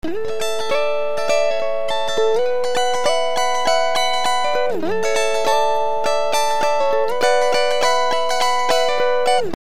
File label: Pitch Shift major seventh, Raised major seventh; Up major seventh